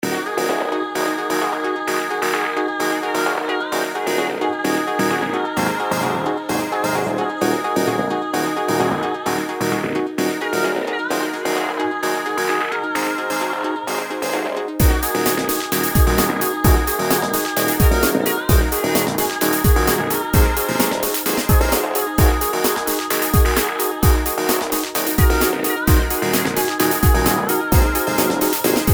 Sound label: trance version